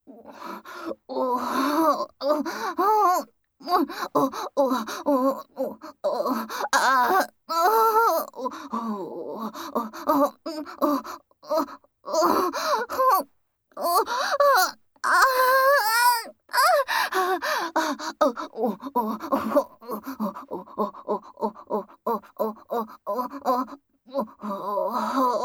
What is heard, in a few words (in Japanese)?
限界オホ声汗だくエロループトラック, ASMR; 高身長バレー部むちむち爆乳彼女との、真夏の蒸し蒸し汗だく溺愛搾精えっち